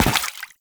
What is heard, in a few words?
Step Water; Enemies; Foley; Footsteps; Warframe; Lasrian Soldiers; Lasrian Butcher